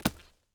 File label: lumber tree